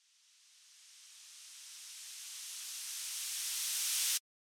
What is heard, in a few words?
Noise 120BPM G m Deep Riser